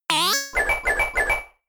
Metronome; Pokemon; gen 4 attack moves; DPPL HG SS

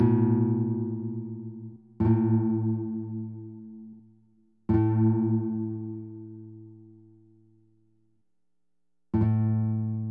Playing Chords; betrayal at krondor

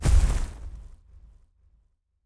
Level Editor Remove

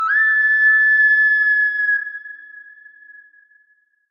Hunt Signal; Assassin's Creed III

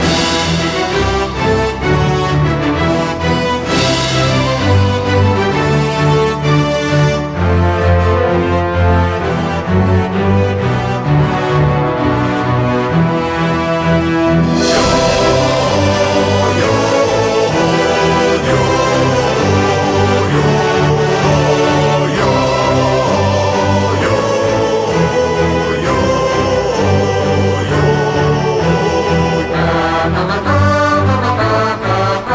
Music - Race Winning

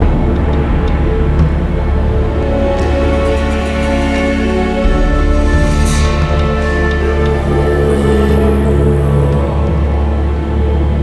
Siege Field Melody; Ninjago Monastery; lego universe macos windows gamerip 2010

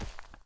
PLAYER FOOTSTEP; Dino Shift 2

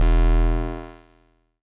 Upgrade Cabinet Start; Redmatch 2

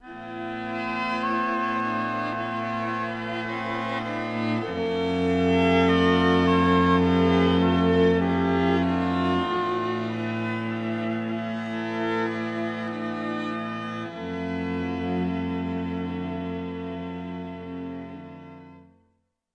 all strings; Dark Fall II Lights Out

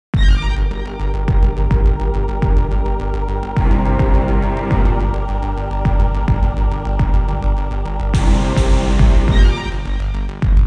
Resounding Alarm Bell; Koisuru Otome to Shugo no Tate

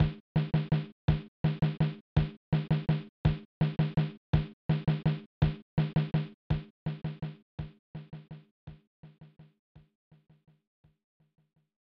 Drumbeat; fun n games 1994 snes

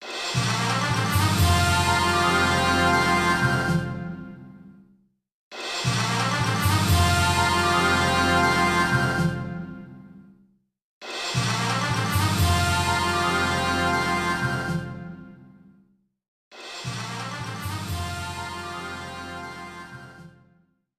Intro Fanfare

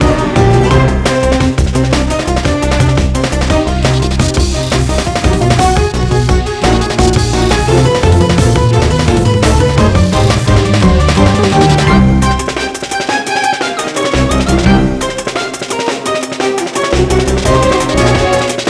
loop 172bpm; chicory a colorful tale; ps4

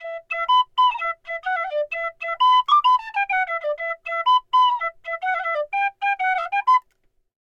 Flute Player Melody; The Witcher 3 Wild Hunt

